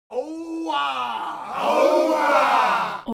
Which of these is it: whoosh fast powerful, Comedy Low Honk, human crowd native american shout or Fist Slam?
human crowd native american shout